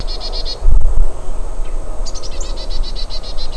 chickadee, Carolina Chickdee

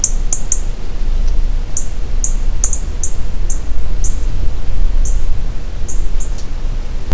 northern cardinal chips

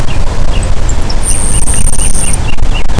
grasshopper sparrow

